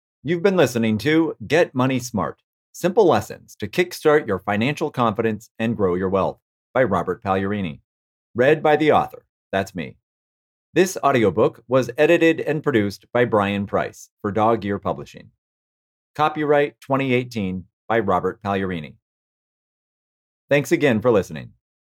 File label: Closing